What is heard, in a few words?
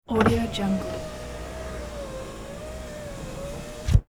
Ford Crown Victoria window roll up; sound-ideas